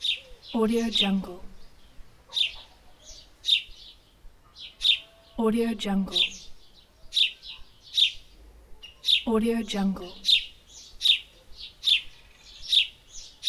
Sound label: birds tweet